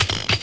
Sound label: click